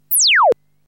Bullet

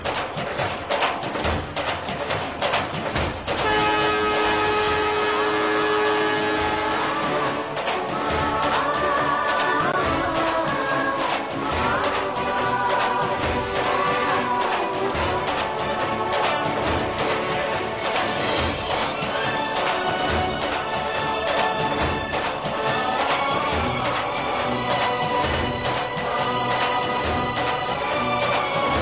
Instrument

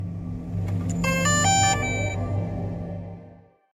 drone

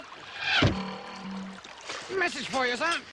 Message; Python

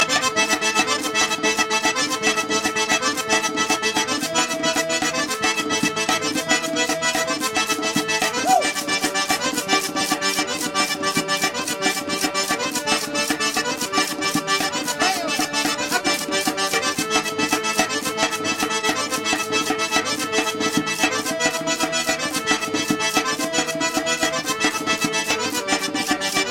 Circassian Shashan

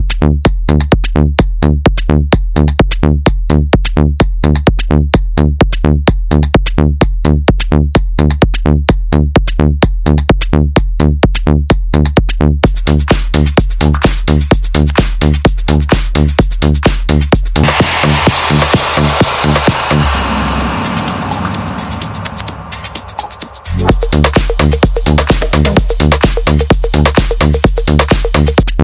Bubble Bass

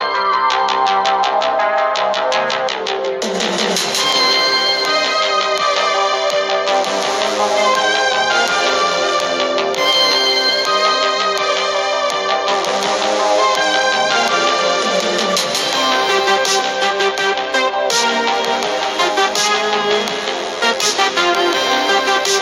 retro; Mandalorian

